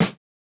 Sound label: snare, snare house